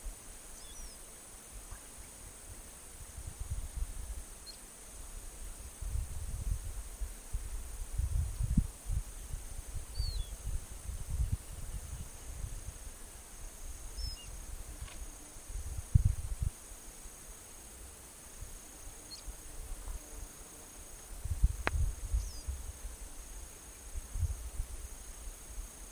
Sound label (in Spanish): Capuchino Canela (Sporophila hypoxantha); EcoRegistros